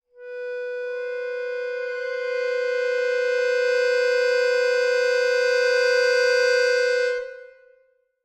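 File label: Accordion Keys Tension; keyboards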